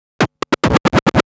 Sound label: Scratch Fills 140BPM